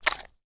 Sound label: boot foot pull out thick mud puddle